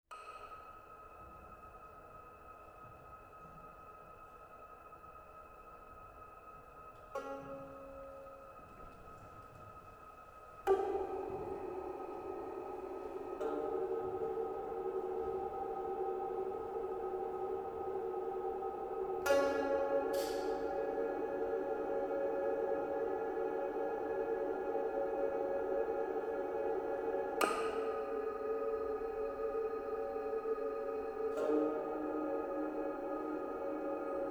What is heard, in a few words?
reverb; Blik